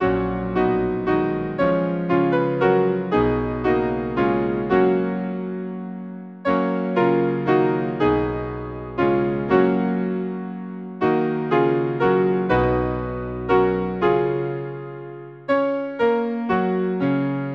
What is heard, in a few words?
Hymn, piano, Moscow; Christ for the world we sing